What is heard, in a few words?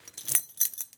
Foley Keys Belt Metal Jingle; Legend of Keepers